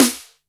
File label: Boss DR-660 Snare, Drum Machines